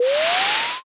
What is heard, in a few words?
wind magic